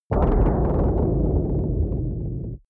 protective shield hit absorption rattle short; energie

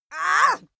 female attack

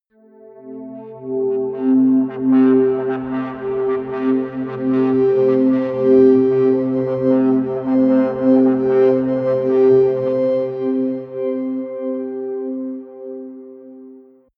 atmospheric feedback pad mid, drones

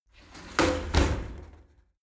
shower slide door close heavy

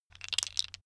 tiles wood rattle; objekte; holz

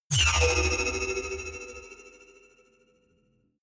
sci-fi magnetic sling big high; energie

